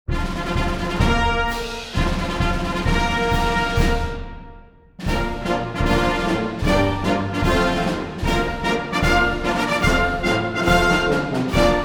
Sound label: TWO RUFFLES AND FLOURISHES AND GENERAL'S MARCH